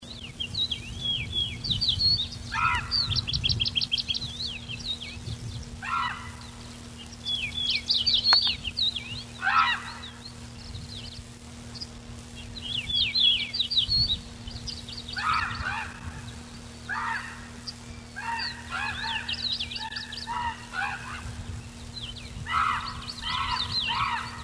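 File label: Corvus corax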